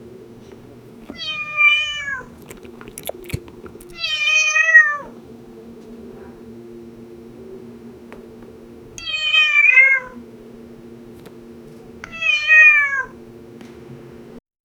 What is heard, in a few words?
a cat in cafe drinking; angry